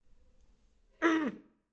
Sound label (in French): quinte de toux